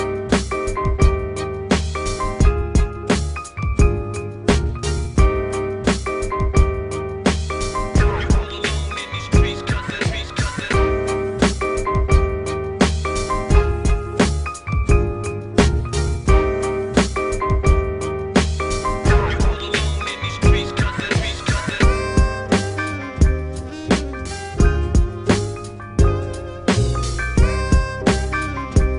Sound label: piano hip hop beat